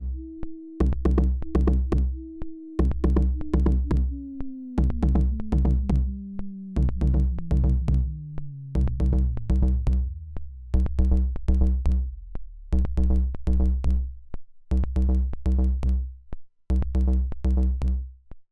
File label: spring filter Q CUT; Snazzy FX; the eternal spring filter